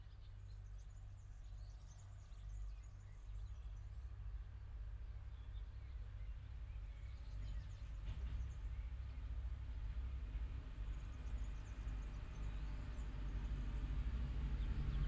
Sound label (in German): Traktor; Lexikon-Roman